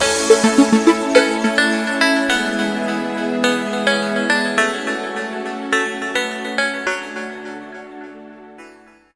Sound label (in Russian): melodija